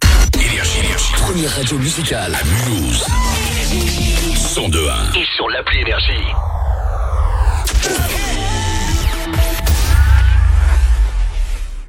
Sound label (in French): JINGLE, Première Radio; MULHOUSE, 102.1 FM; NRJ